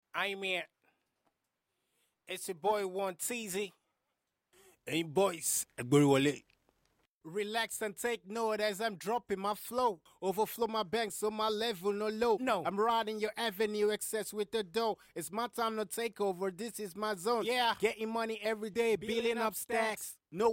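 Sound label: hip hop 95 DM, acapella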